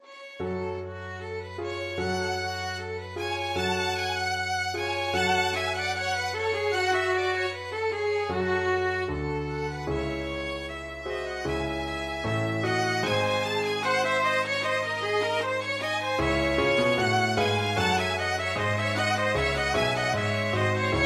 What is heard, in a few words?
but ere we this violin duet